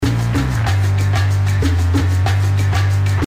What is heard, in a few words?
instrumental